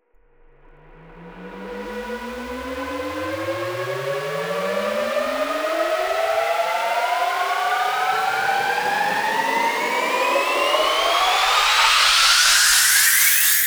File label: 124 FX